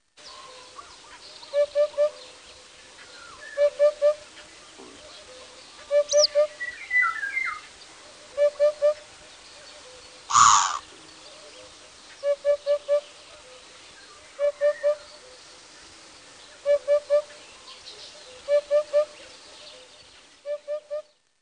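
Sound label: Hoopoe; Madarak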